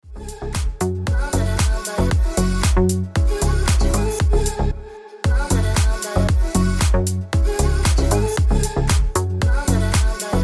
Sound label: Ringtone